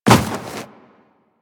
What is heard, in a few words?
Run Snow, Footsteps; DE; Warframe; Tenno